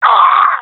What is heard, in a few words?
death; Counter Strike; CS:GO; professional epic